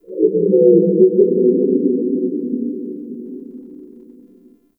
Exterior Low Mid Space Texture, Gas City; Warframe; Corpus Gas City; Ambience

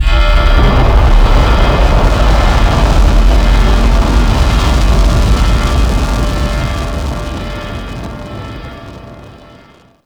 Retrieval Core Rumble; Warframe; Missions

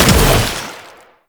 Shot, Infested Arm Cannon, Weapons; Warframe; Coda Bubonico

